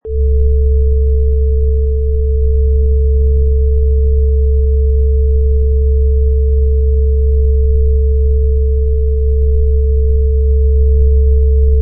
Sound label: Mega Sub Bass